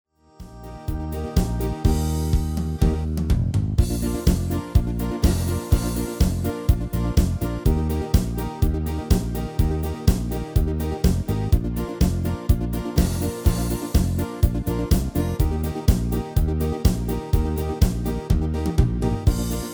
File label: MIDI